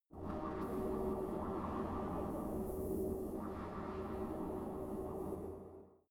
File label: space inside biomedical alien shuttle